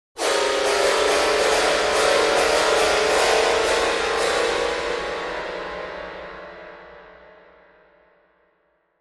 Piano Strings, keyboards piano

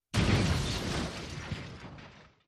Car Crash Roll

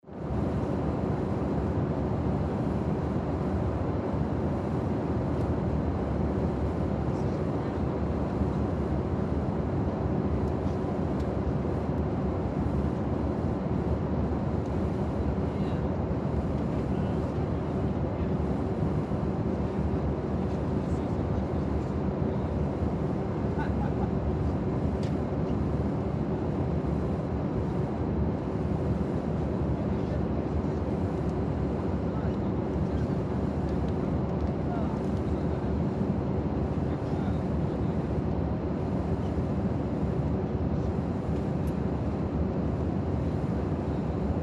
727 Int Stdy Flight, interior perspective; Boeing 727, interior, constant flight

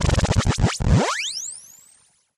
Multiple ray gun blasts. Zap, Ray Gun

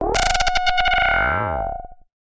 Interface Screen, Sci-Fi Space; Interface Screen 6

